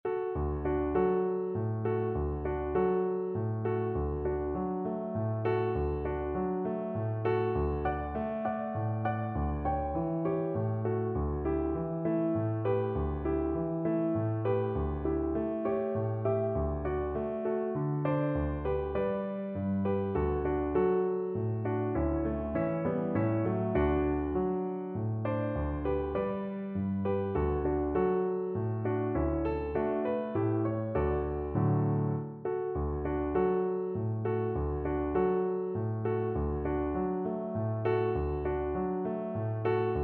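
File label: lullaby VLC karaoke, Cello; brahms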